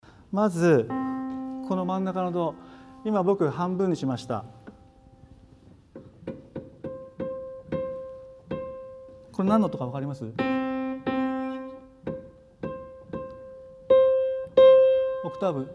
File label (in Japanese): 倍音実験